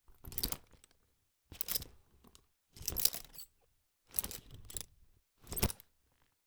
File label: leather, metal, twist, contort, large, rough, lining, chunky metal, brass, zippers, plop; open,close,multiple,clatter,slide; Eiravaein Worksb; Quirboilly